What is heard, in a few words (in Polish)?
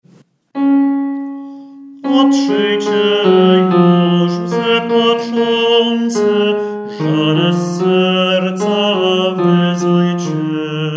TENOR; Otrzyjcie juz lzy